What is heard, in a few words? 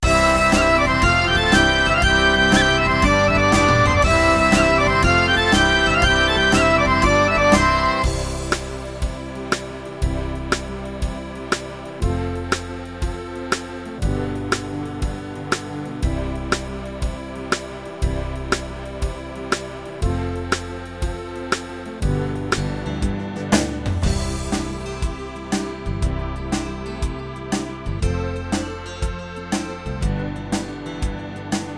Christmas Celtic, rhythms; mzx500; preview pack 02